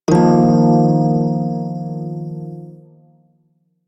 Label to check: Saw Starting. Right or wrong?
Wrong